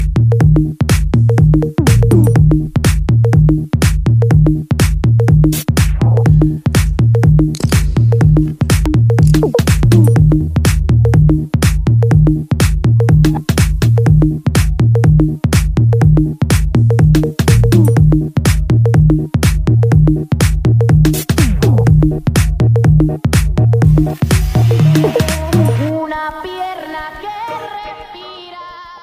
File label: aqua ambiente